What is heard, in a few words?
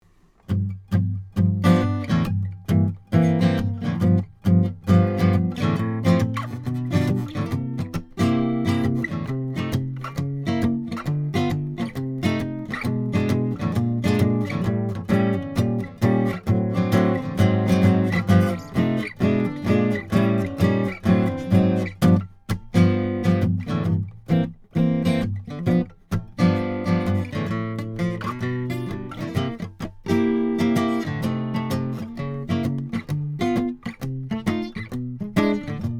Jazz; Realios A9031